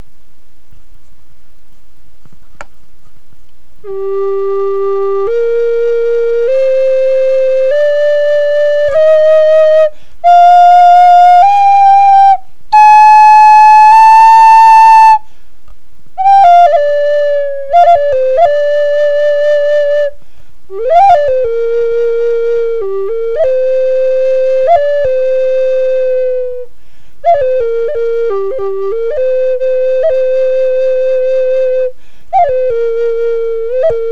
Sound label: G 88 bore, flutes